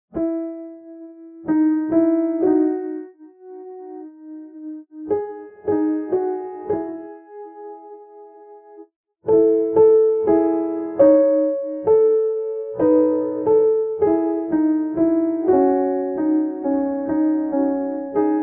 Chopin rightHand WienerFilter; Op010-03

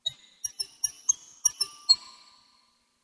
Glockenspiel

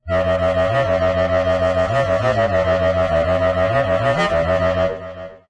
Synth Mono

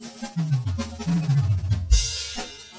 Drum Solo

